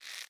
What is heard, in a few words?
roman ranged bow charge; smite; npc; roman archer